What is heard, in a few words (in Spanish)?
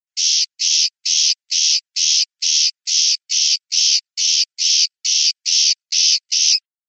rep rabieta achillonada, pardillo